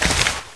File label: splash; MAXOVER